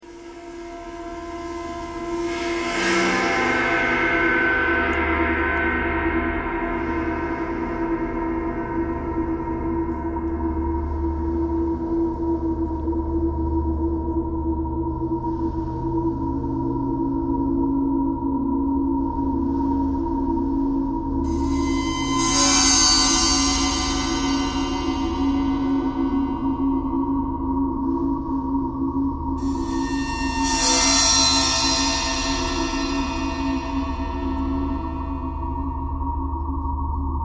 ice bowed cymbal traffic k core birds thread simple; bowedmetal